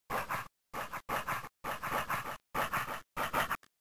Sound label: BGM BOMB; WarioWare Inc